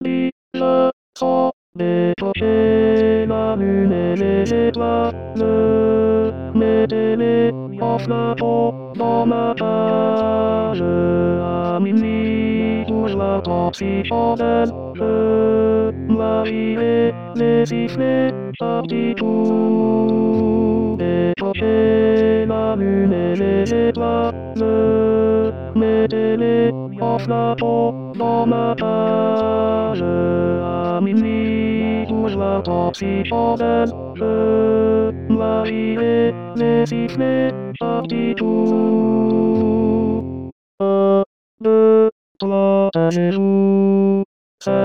Meli melo2 ten

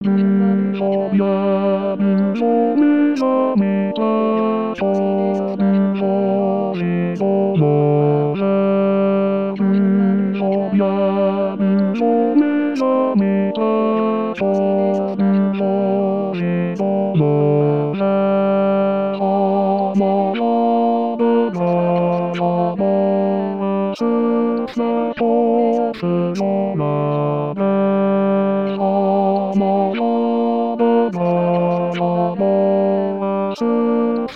Le Tourdion bas

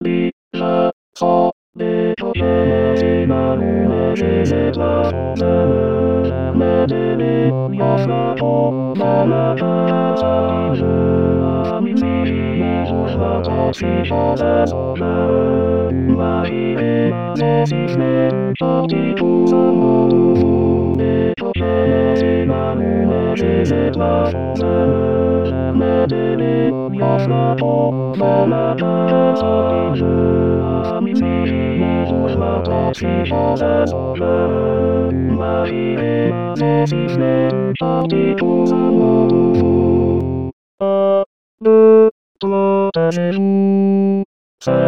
Meli melo2 tut

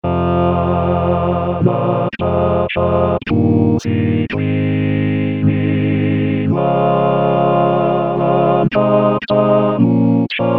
Tutti; Hanac pachap

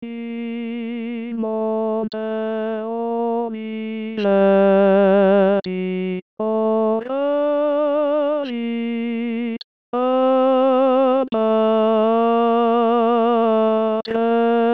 In Monte Oliveti barytons

